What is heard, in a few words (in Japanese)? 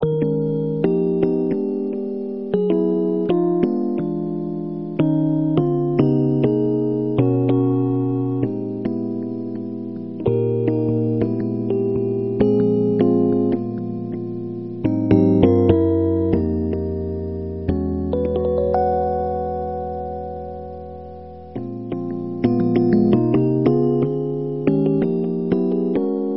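日本の童謡をJAZZっぽいｺｰﾄﾞ進行にしたら第2弾、ゆっくり弾いて2倍弱にテンポアップ。 エレピ音でリバーブ・コーラスなしで弾いています。; 日本の童謡A JAZZｺｰﾄﾞ風ちょうちょ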